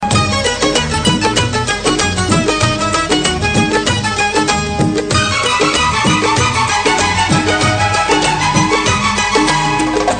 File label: Hq Melody Karaoke